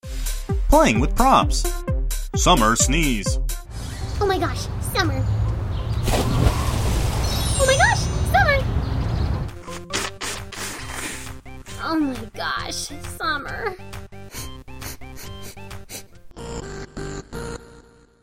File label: Summer Sneeze